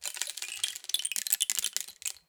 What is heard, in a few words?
Rain Stick, Percussion Samples